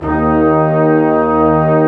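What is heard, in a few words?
Section, Brass Large Ensemble long; Roland L-CD702; Lrg.Ensemble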